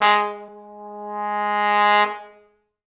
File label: Pop Brass; Best Service ProSamples vol.19; AKAI